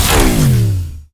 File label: laser deathray end; lighthouse moon; lighthousemonster